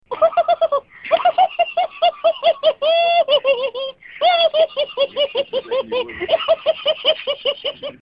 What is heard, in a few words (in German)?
Irres Kichern